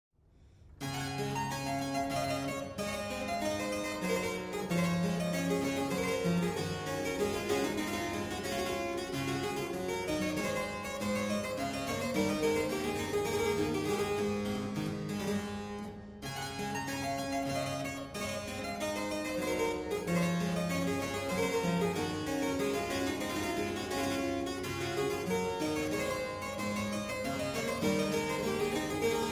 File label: Hornpipe